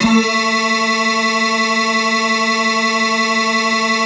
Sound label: synth clav; Best Service ProSamples vol.55 - Retro Sampler; AKAI